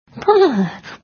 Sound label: shivering; baby; Oswald